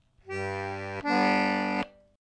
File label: Just phrase one; Back Door